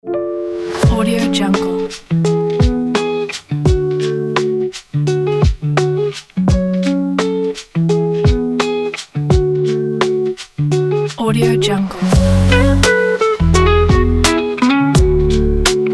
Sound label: nostalgic romantic hip hop